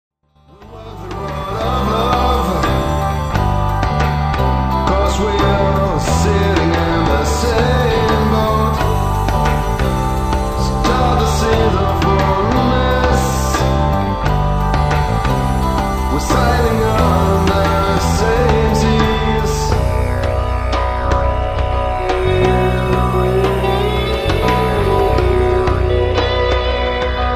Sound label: Country rock